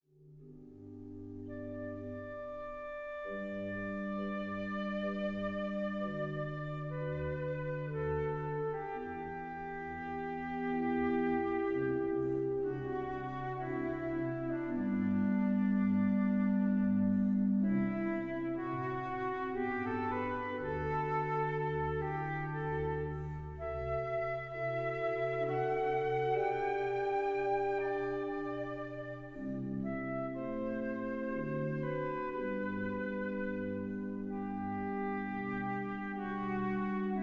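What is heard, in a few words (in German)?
transverse flute, largo; Querfloete; musik zur trauerfeier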